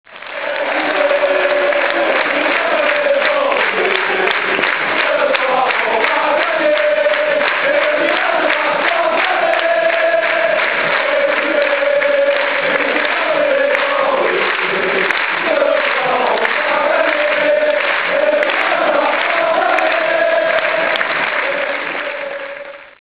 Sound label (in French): Ecoutez chanter les Bordelais